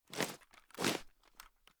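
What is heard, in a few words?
reload movement, weapons; gmod; viper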